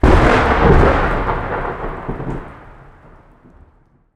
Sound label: thunder; Earth and Beyond; EA Games